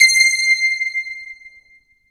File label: JV polysplit, JV-1080; Syntec; Wall of Sounds